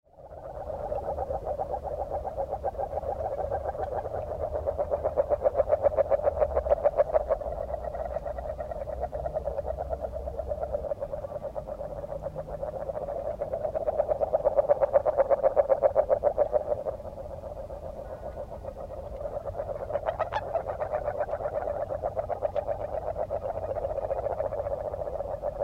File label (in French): grenouille agile